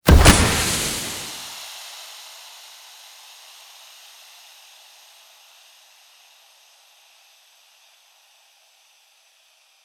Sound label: smoke emit, smoke grenade; weapons; Counter Strike Model Pack; CS2